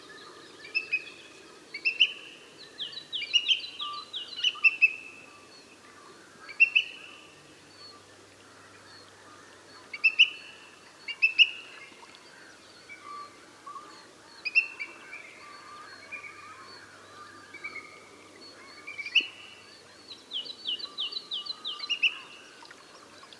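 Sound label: orel karlik golos, booted eagle voice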